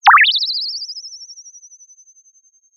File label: Sound of a bird tweeting nicely